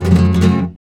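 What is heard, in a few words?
RASGAND G minor, FLAMENCO Guitars; Spectrasonics; Hans Zimmer Guitars VOL-2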